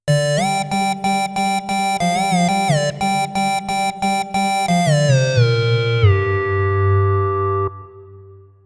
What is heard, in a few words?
Synth Lead